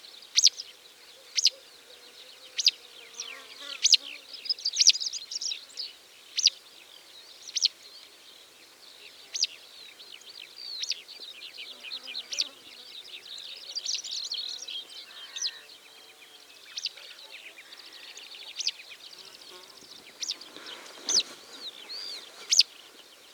Citrine Wagtail Motacilla citreola, citrine wagtail call